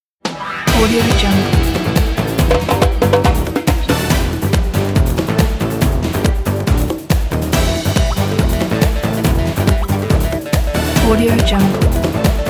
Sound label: epic african soccer show